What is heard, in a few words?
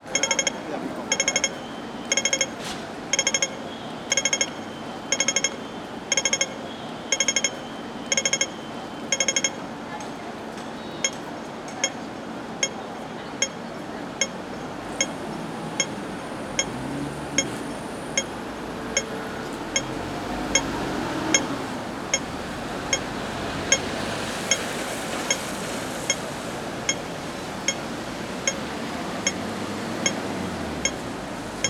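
Hong Kong ambience Pedestrian Crossing; Sounds of Hong Kong; Resonant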